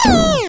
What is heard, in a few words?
retro damage hurt ouch